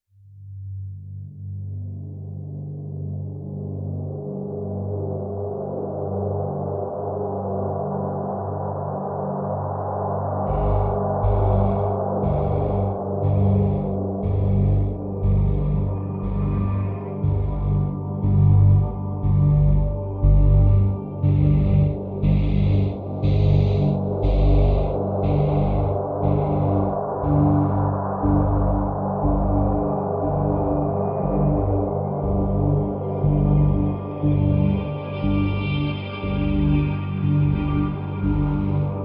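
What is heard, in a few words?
Drone Wind Farm G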